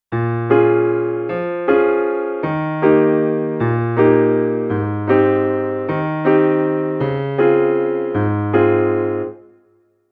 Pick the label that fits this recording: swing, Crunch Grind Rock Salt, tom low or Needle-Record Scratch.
swing